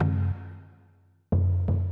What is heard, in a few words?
PERCLOOP ETHNO 125